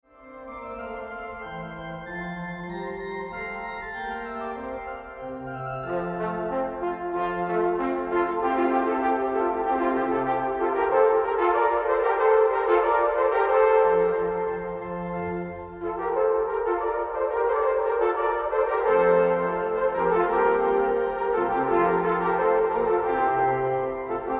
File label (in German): Allegro, trompete orgel; Rathgeber